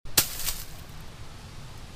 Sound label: Snare Leaf